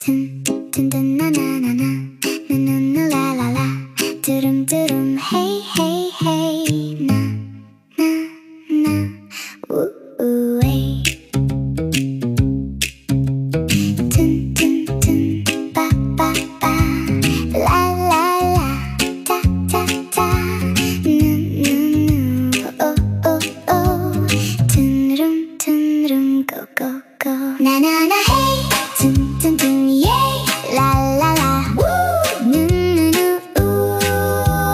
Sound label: Tiny Happy Tune; The Meme Factory